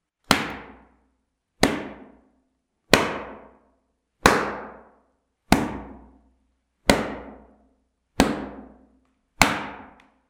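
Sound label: whipping sound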